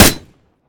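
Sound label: mg3 shoot; tacz; daffas arsenal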